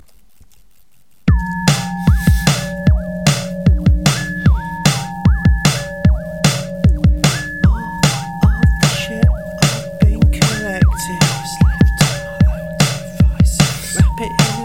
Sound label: bass rock; weeklybeats-2014